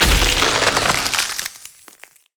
ring of frost; LoL; lissandra

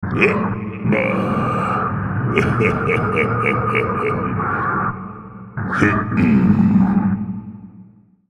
laugh; Nautilus; Character Voices - English

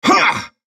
effort

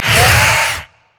spider attack; LoL

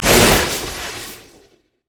electrical surge bolt; LoL; kennen

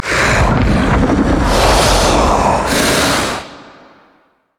spider idle; LoL

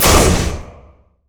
passive shot; LoL; lucian

